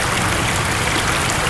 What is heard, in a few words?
SFX Falls